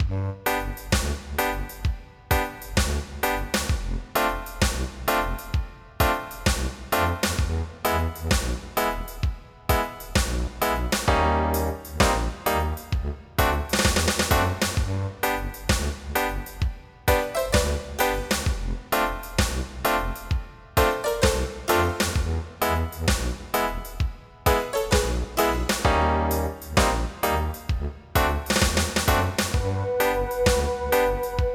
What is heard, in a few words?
REGGAE